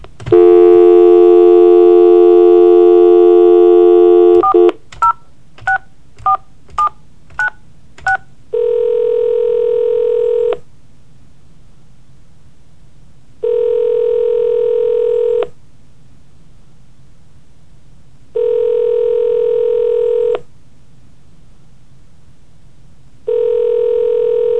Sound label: telephone